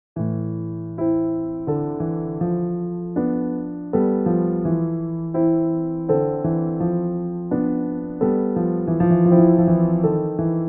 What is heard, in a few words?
waltz